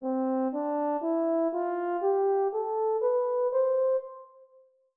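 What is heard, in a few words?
C major scale 5 notes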